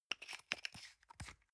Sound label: toothpaste screw cup close medium